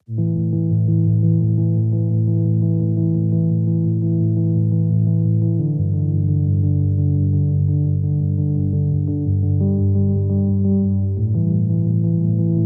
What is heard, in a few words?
Simplicytech, Hook